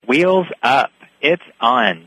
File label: Ringtone